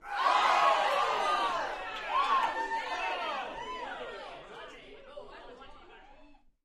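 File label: Crowd 15 Interior Rowdy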